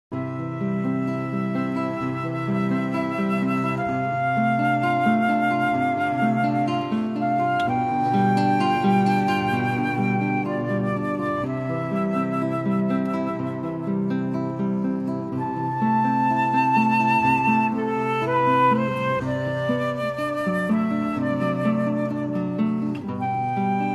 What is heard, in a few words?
Ave Maria, guitar flute bass trio; Bach Gounod